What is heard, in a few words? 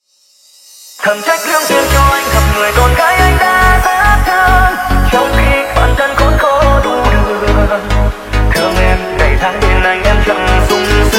remix